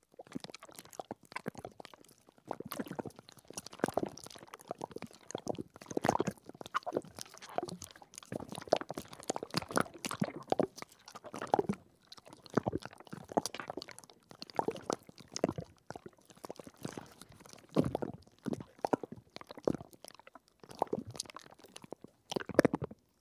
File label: Thick Liquid Gurgles; Low Thick Liquid Gurgles And Bubbles With Crunch